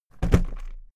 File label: briefcase plastic drop hallow; industrie wirtschaft; bueroarbeit